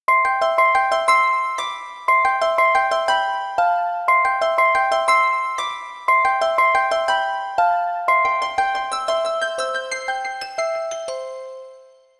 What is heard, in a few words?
Harpbox; OnePlus One; ringtones